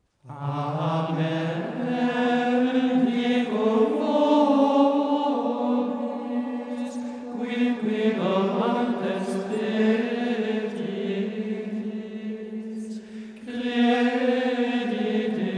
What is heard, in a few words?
communion; Amen Dico Vobis; Triors